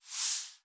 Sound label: Soft Slide Short